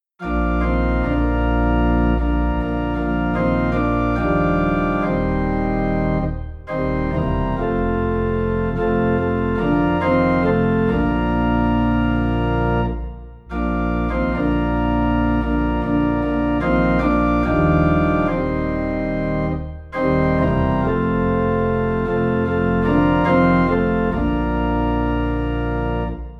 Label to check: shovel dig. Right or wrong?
Wrong